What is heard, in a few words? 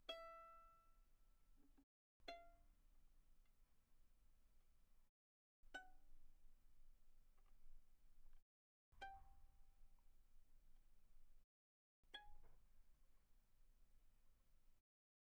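Violin pizzicato pianissimo sul E E5 B5; Strings; violin2012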